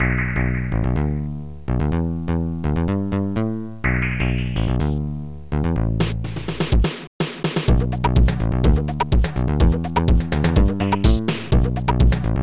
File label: fin music; snacky